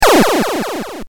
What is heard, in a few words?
boss dead; spaceinvaders